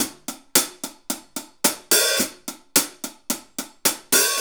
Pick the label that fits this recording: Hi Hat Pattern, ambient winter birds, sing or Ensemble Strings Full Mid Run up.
Hi Hat Pattern